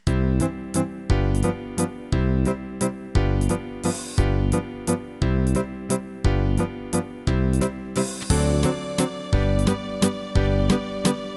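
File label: Viennese Waltz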